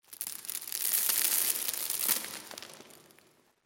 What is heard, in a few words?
cavern excavation pebbles; ambient, rocks